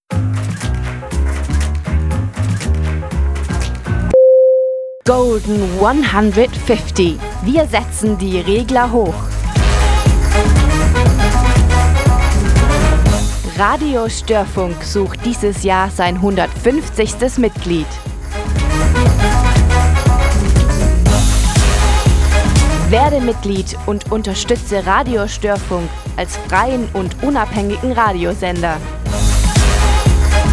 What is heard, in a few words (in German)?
radio jingle; radio sf; golden 150; kurze version